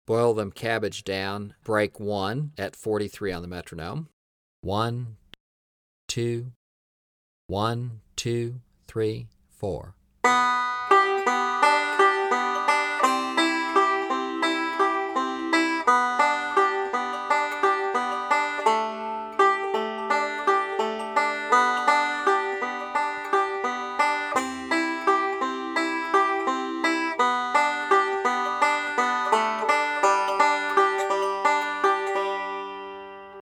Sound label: 43 Bpm; Boil Them Cabbage Down Break 2